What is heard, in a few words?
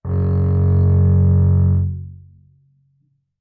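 Bass arco fortissimo sul E Gb2, Double Bass; MIS Pitches - 2014; Strings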